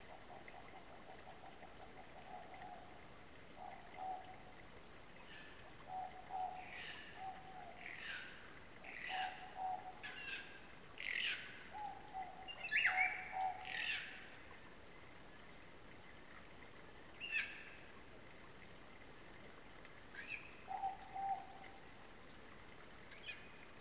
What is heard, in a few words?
Kaka ruru